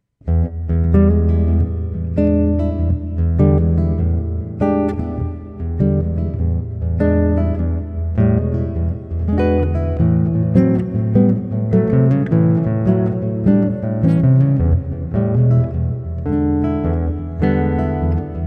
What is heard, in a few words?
blues